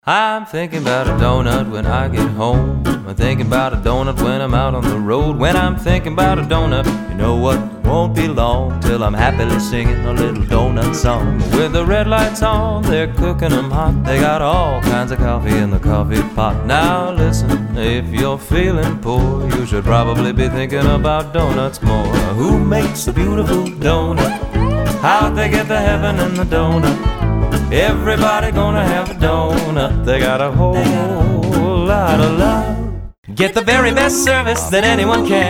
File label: Jingle